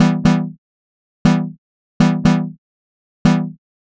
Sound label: Em Chord Dry